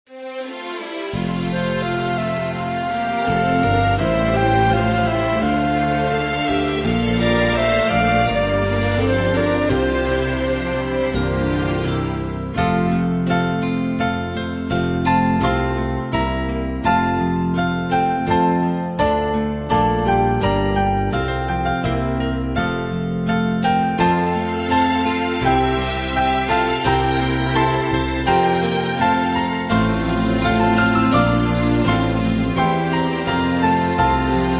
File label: Instrumental Hymns